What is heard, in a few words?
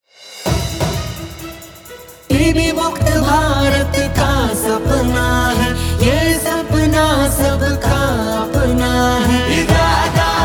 Jingle; IEC Material; NTEP